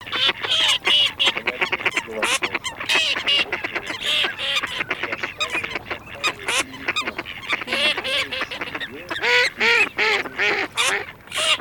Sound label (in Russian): Утиный базар Anatre mix